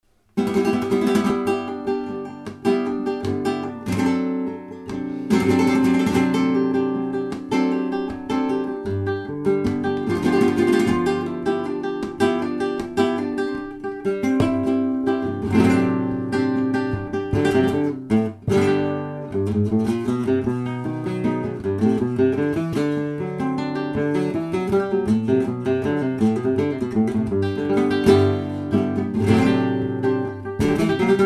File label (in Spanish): alegrias